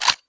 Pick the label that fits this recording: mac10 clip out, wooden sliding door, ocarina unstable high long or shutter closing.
mac10 clip out